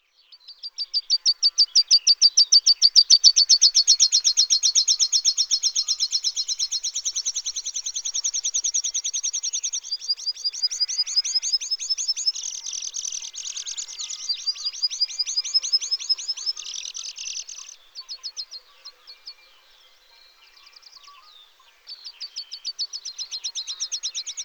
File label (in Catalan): Grasset De Muntanya